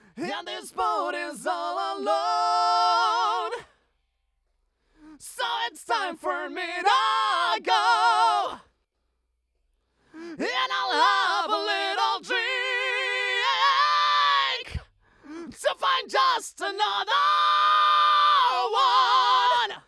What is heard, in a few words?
mixture, singing librispeech; MedleyVox